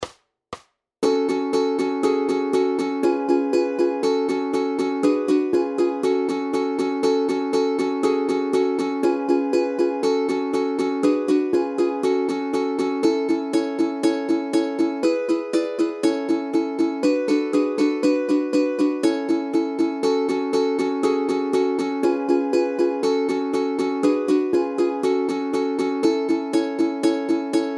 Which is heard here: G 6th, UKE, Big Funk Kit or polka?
UKE